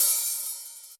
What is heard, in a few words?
Open HH, Yamaha RY20